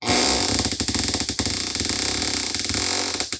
Door Creak

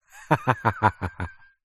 male middle aged laugh ha ha slight wheeze; human; zapsplat

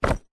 office plastic document wallet set down; zapsplat

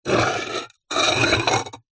household pot ceramic base lid plate scrape

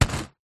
food packet pasta drop down on surface

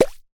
comedy bubble pop; sound effects one

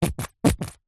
foley sunscreen bottle squeeze empty nozzle; zapsplat

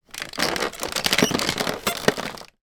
household vacuum cleaner upright movement creaks; sound effects one

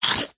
human eat crunch bite single dry cereal piece

